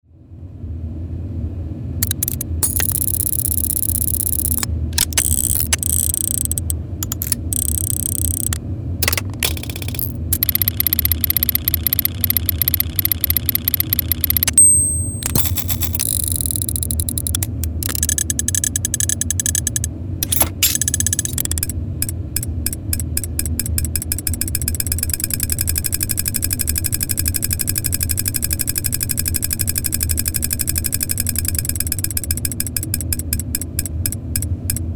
Tiny Gears Small Mechanism Sound Effects; Bluezone

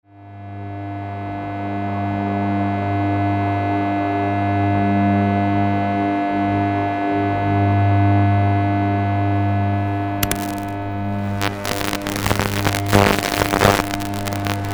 High Voltage Electricity Sound Effects; Bluezone

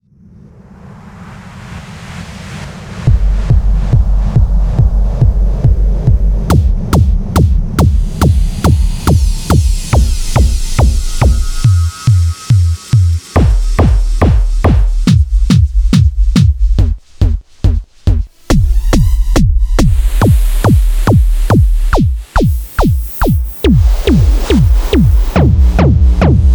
Modern Analog Kick Drum Samples; Bluezone

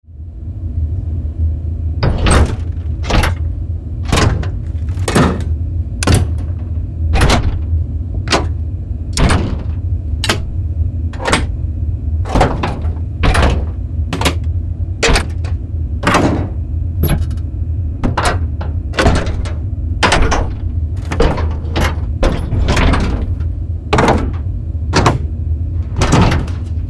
Industrial Lever Switch Sound Effects; Bluezone

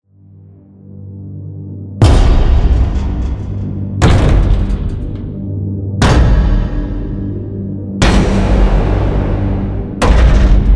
Modern Cinematic Impact Sound Effects; Bluezone

